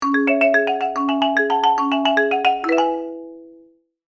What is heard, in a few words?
marimba ringtone